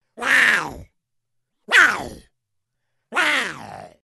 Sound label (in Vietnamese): tieng keu cua meo hoang bao su tu hoac cua ai khac